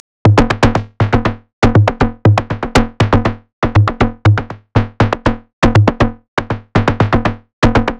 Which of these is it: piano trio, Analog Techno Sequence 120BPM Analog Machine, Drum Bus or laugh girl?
Analog Techno Sequence 120BPM Analog Machine